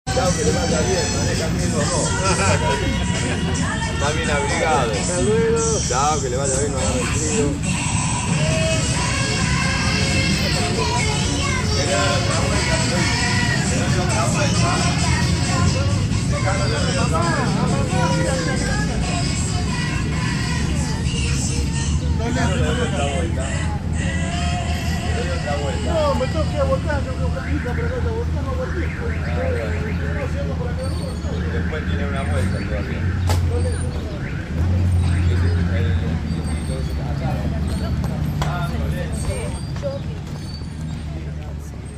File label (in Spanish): plazas calesita; la merced; Rosario